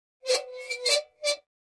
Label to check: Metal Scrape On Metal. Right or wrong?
Right